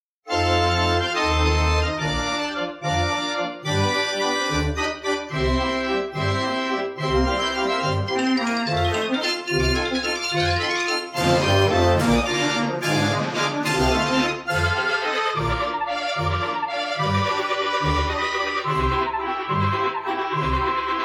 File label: Belgian Band Organ